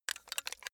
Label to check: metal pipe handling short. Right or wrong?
Right